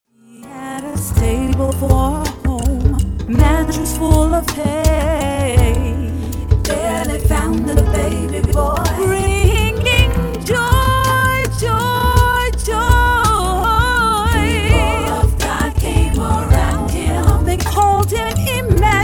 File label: CHRISTMAS MEDLEY